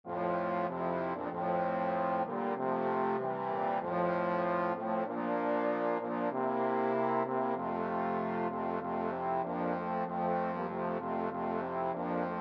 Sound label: trombone quartet